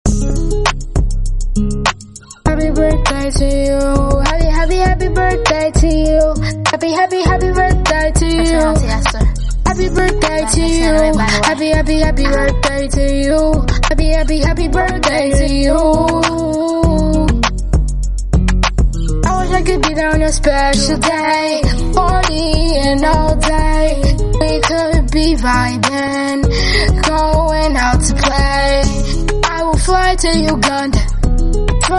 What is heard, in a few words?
Happy birth day Song